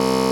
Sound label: buzzer button